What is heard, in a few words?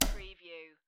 Poker Chips Place One Chip Onto Another On Wood Table